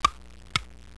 tictoc; qgo

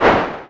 snow bump; linux-virtual-jay-peak; soulride

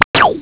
flare; xpilot

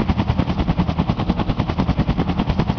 Hover; freeciv

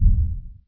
menu back; freetennis

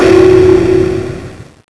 life lose

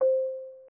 groupchat their message; tkabber; psi

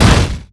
clank; trigger; evo

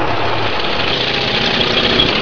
hev start; netpanzer

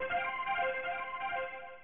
message; sim-im; sim-0.9.4.3

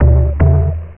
presence available; tkabber

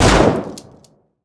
sniper rifle; weapons; ufoai

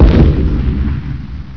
expl; netpanzer

